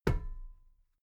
metal ladder step soft muted

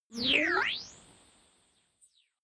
notification fly by synth

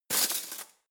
cutlery spoons drawer grab hard

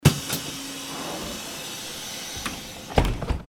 bus door closing

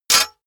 sword block metal

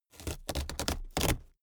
cardboard box big rip open

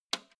old switch harsh snap

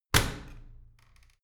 washing machine close hard

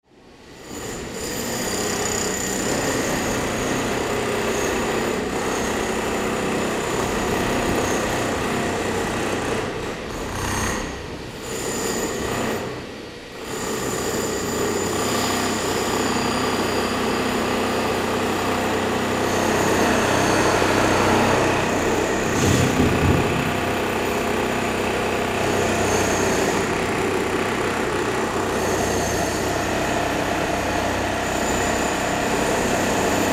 drill hammer in a tunnel

